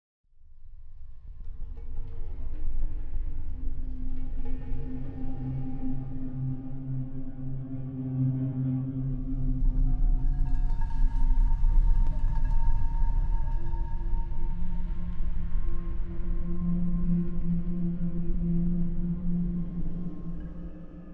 Dark Ambiance

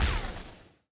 rocket

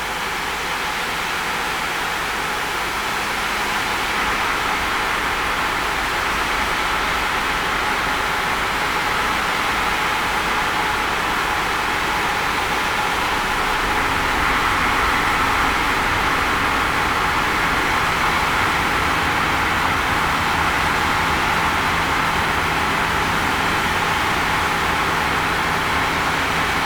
rock ambience; Space Captain Yanya - Original Soundtrack

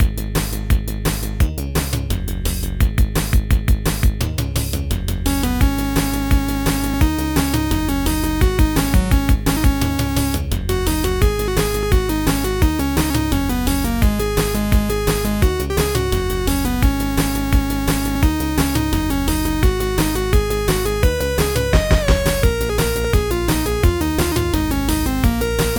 rock rhythm; themes and jingles; The War Over A Melon Field

